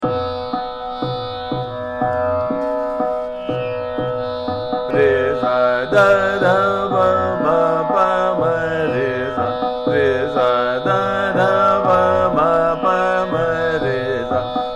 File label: Sargam - Jhaptal, Jogiya